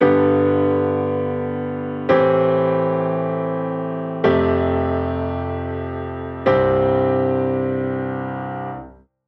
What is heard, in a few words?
grand piano roomy pop; xln addictive keys